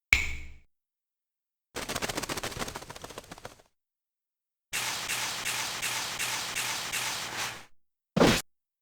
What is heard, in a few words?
transformation; simon